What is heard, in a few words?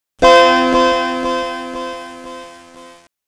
STARTUP, COMPUTER